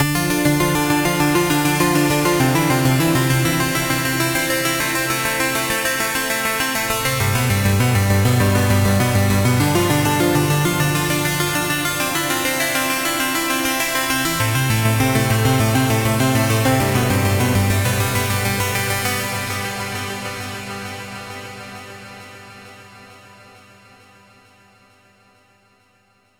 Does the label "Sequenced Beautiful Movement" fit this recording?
Yes